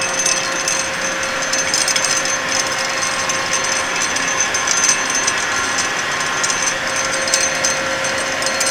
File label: LOOSE PIPE, Industrial; SFX